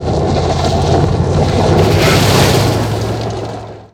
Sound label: Second Jeep Audio; vehicle; mohaa